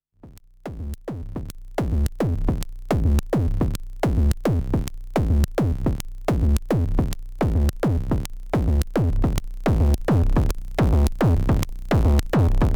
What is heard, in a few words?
DFAM Low Headroom; JHS Colour Box V2; Moog DFAM